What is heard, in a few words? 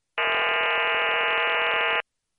VARA FM; ham